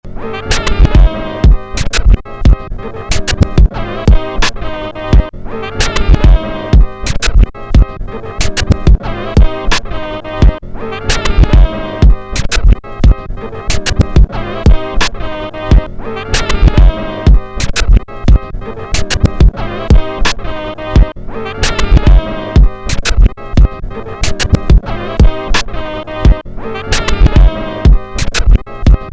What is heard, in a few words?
jazzy